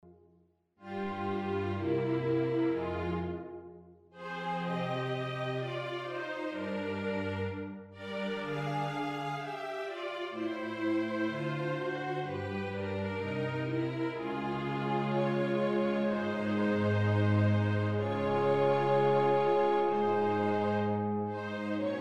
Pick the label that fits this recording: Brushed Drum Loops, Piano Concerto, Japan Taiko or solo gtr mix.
Piano Concerto